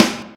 snare 1; virtual drum sounds

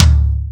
tom, drums; virtual drum sounds; custom drums

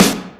snare, drums; virtual drum sounds

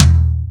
tom, drums; virtual drum sounds; custom drums

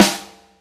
snare, drums; virtual drum sounds; custom drums

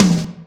tom, drums; virtual drum sounds

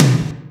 tom 4; drums; virtual drum sounds

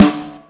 snare, drums; virtual drum sounds; custom drums

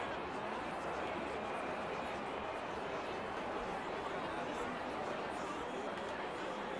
live, drums; virtual drum sounds; custom drums

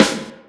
snare, drums; virtual drum sounds; custom drums

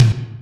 tom 4; virtual drum sounds; live drums

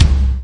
kick; virtual drum machine

